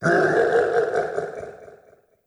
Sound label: bowser laugh